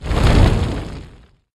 phoenix ring of fire spawn

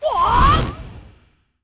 Hero Monk Firedragon

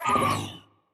dragons wardens growl; sfx

